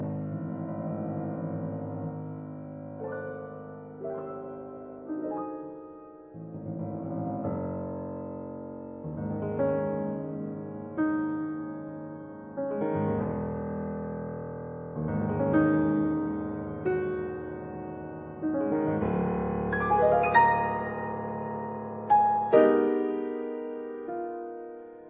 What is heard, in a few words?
Piano Sonata